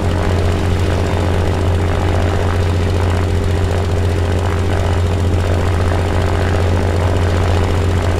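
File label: me109 cockpit right, engines; War Thunder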